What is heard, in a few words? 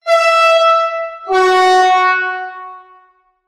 horn; Taurushorn